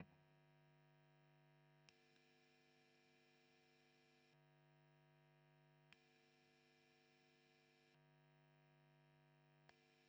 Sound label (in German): Rauschen; JHS Prestige